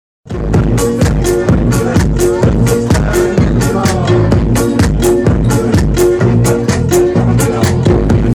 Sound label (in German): 8 Sekunden eines Technotracks; Zürich; Friedas Büxe